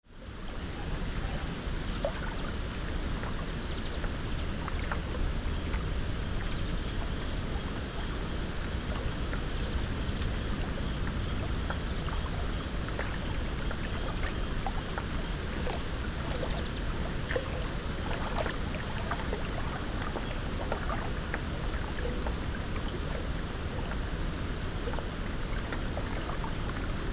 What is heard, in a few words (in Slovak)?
male zaluzie rybnik pri hradzi